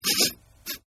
Sniff; Sickness Sounds; Sniffling; Human; Sniff 02

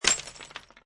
Glass Break

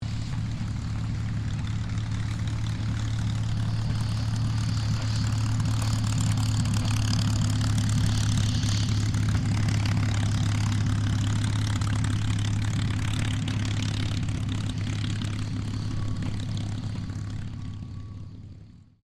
AIRCRAFT CA-18 MUSTANG TAXI; P51 /CAC-18 Mustang; Airplanes; Vehicles

